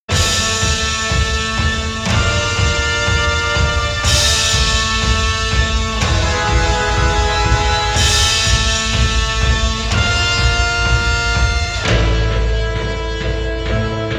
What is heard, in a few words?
instrumental title melody; Deadlock